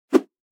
Fast swish

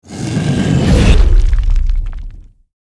Werewolf jump powerful monster

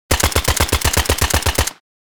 Tactical assault rifle short burst

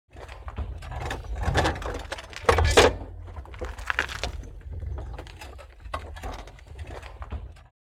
Pushing the scooter on gravel

